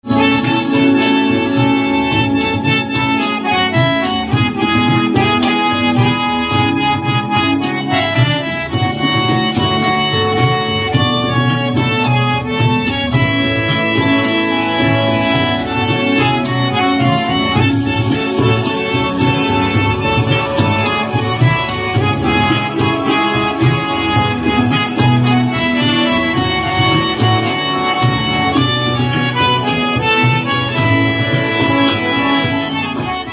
HARMONIUM

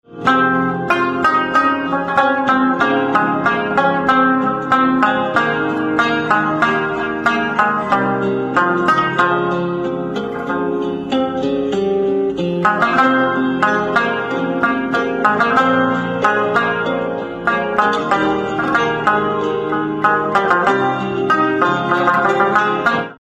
Banjo Instrumental Ringtone

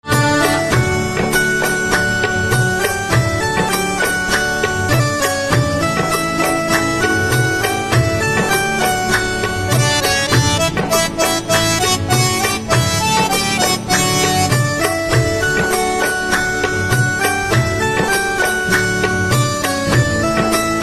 Sitar Ringtone

